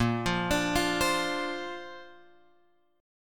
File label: A sharp Major Add 9th; A#add9 Chord {6 8 X 7 6 8} arpeggiated